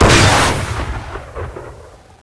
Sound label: rpg7 fire, weapons